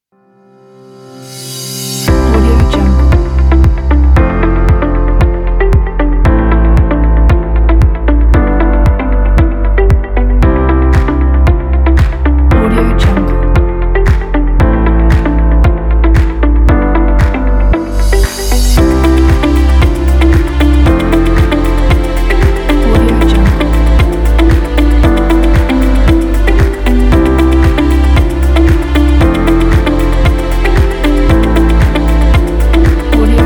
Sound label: upbeat and inspiring corporate